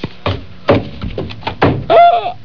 heron

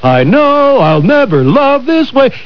song

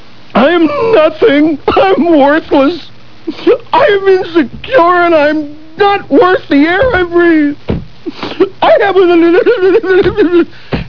crying

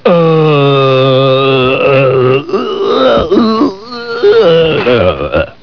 long uhg

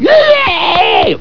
bird

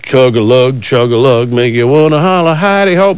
chugalug

